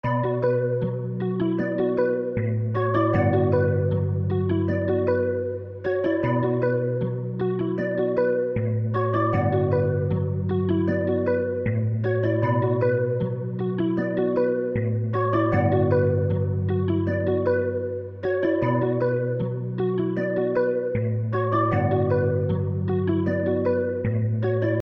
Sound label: Bmin 155 BPM